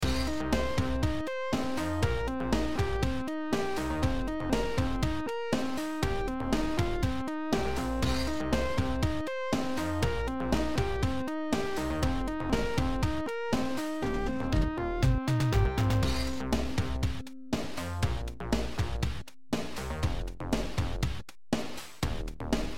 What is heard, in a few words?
bass basstone lead sound beat